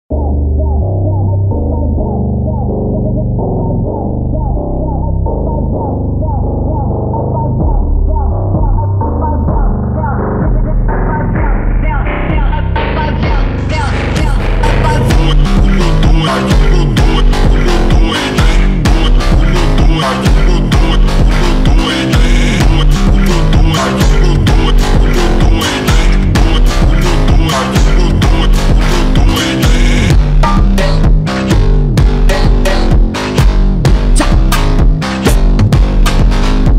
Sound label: brazilian phonk